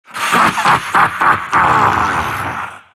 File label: silt laugh; dota; siltbreaker